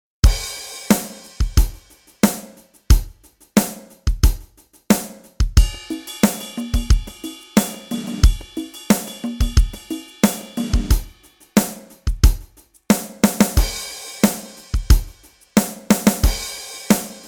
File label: PopRock, live instruments